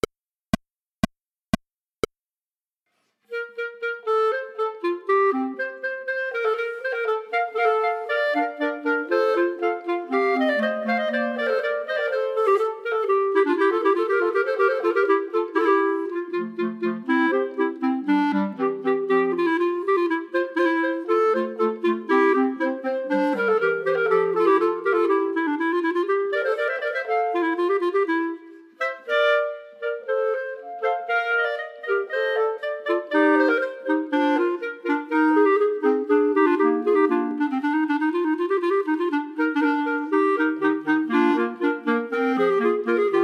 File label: Concerto a 4 in Bb for Bb Clarinets Allegro minus 1st part; Telemann